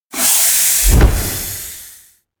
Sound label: collision; ohja; anemoi